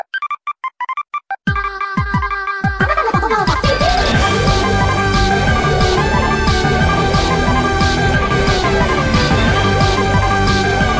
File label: Vocaloid SMS